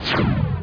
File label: teleport